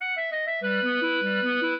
clarinet; Mozart

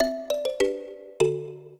trio, mbira; Mozart

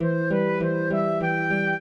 trio, flute harp; Mozart

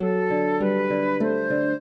trio, flute harp; Mozart

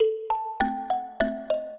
minuet, mbira; Mozart

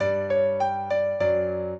piano; Mozart

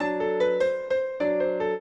piano; Mozart

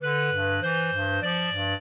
clarinet; Mozart

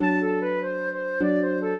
trio, flute harp; Mozart

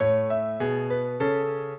minuet, piano; Mozart

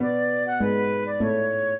minuet, flute harp; Mozart

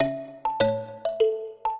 minuet, mbira; Mozart

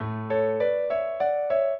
minuet, piano; Mozart

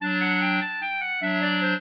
clarinet; Mozart

